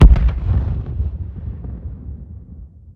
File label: shard blink out; weapons; dota; queenofpain